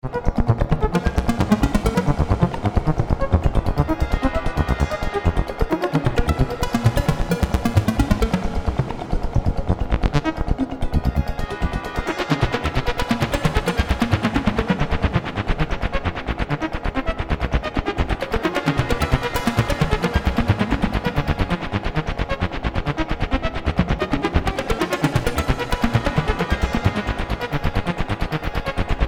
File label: soothed version